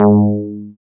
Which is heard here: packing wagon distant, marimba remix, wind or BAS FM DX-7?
BAS FM DX-7